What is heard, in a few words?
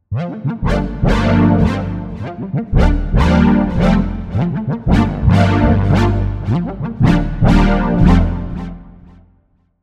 matrix 6 chorus brass; opxpro3